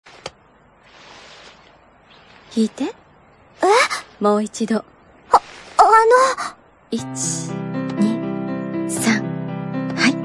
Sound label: Piano Ave Maria